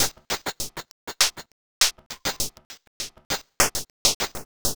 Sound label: White Noise Beat, Electronic Beats; Sonic Foundry (Sony Creative Software); Myths of Technology